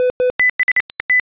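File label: computer; quake2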